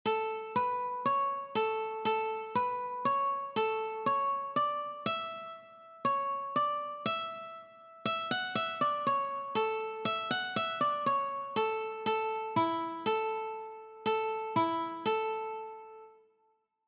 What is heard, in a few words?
Brother John Easy One String Version